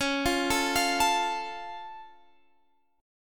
C sharp Augmented, C sharp, down, Guitar, Standard; arpeggiated; JGuitar